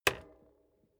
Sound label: bicycle street kickstand hinge up; fahrrad; verkehr transport